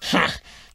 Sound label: pain